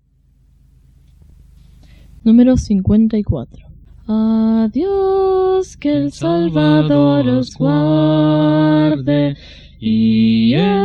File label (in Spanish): Coro, himnario niños